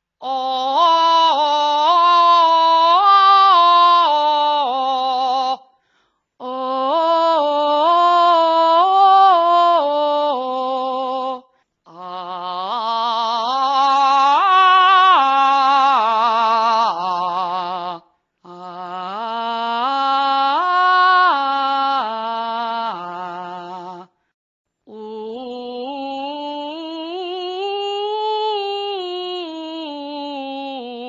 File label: vocals